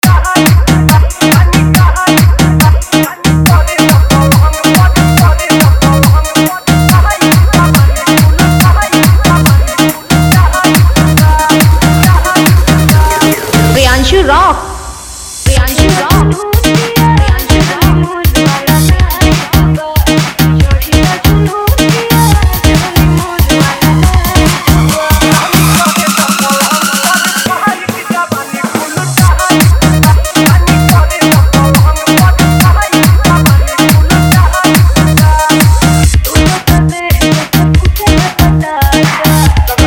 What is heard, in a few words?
Bhojpuri Dj Song